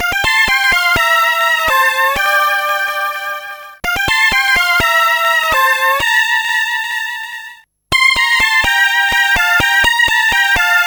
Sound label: french hymn; Trackers; Modland